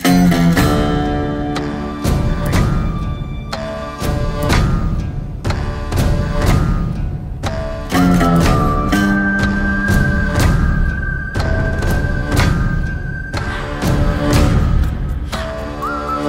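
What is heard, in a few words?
background music ringtone; MALAIKOTTAI VALIBAN TEASER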